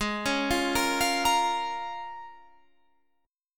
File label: C sharp 6th, G sharp, guitar chord